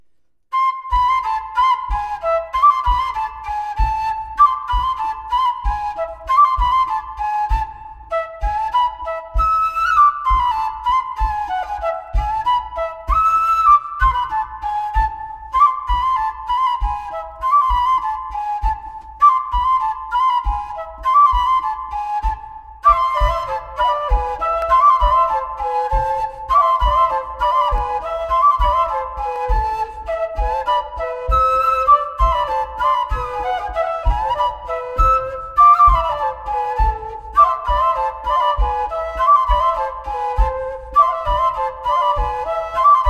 Ronde d Auvergne; Panseron

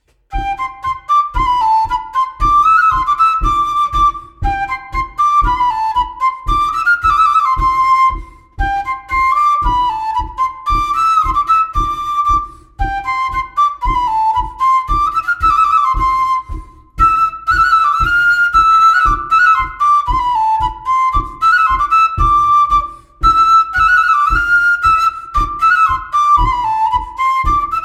Bourée d Auvergne; Ms Cesson de Sergy

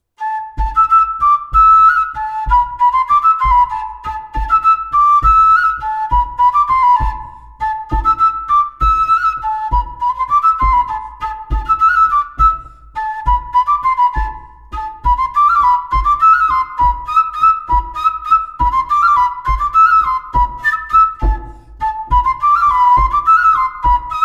Bourree Moderne

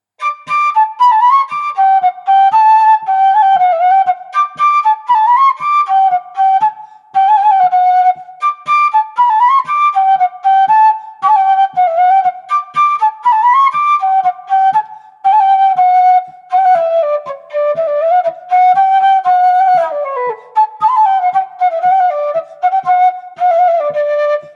Bourree

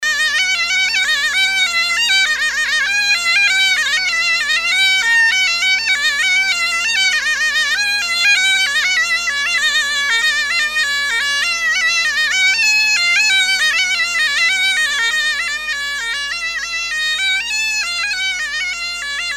Scottich; Scottich de Gavinet

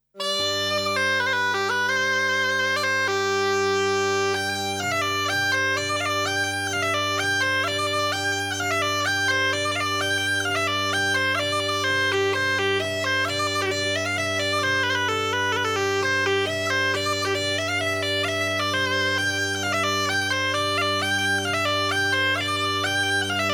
Sautière; Sautière de Gustou

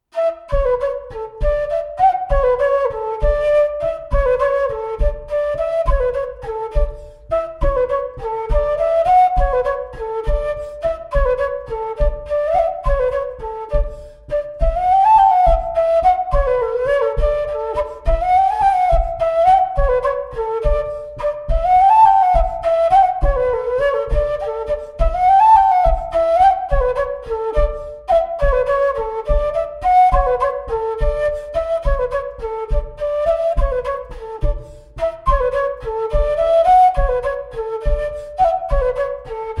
Montagnarde; Recueil De Raoulx

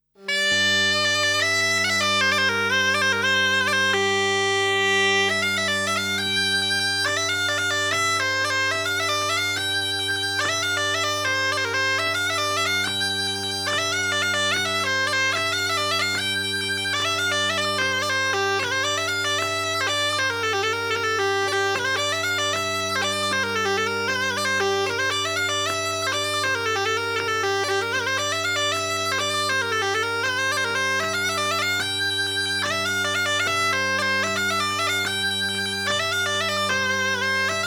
Bourree; Bourree au verre

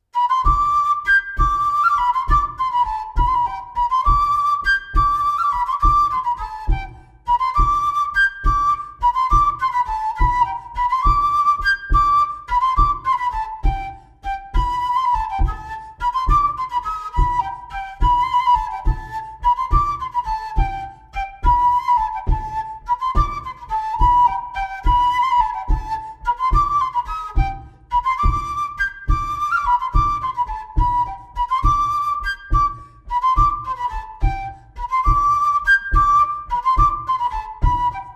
bourree; Coatrieux

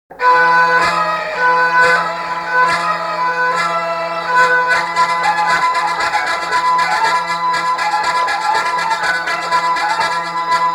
Bourrée D'auvergne Bourrées; Para Lo Lop La

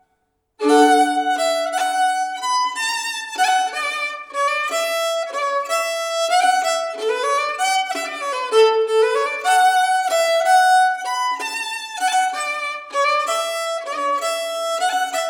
Valse

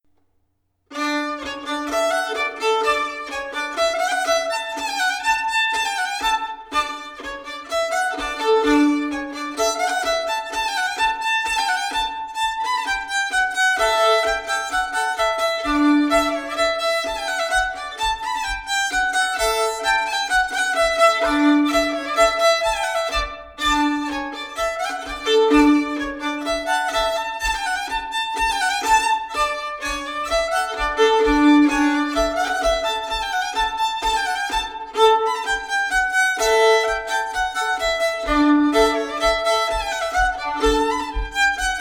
Polka variante polka piquee

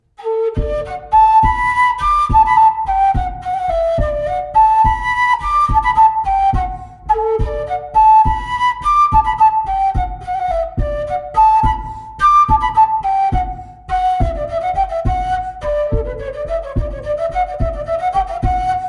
Montagnarde; Recueil Laussedat

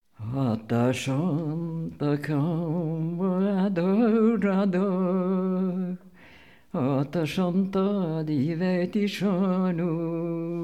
Chanson; A Ta Sanat Camarada Rosador

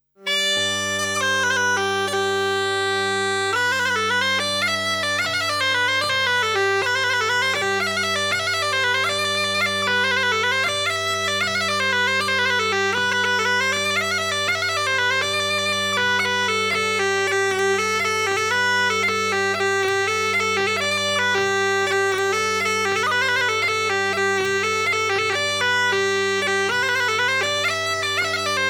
Bourree; Bourree a Berthout

